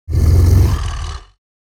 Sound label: Werewolf growling and snarling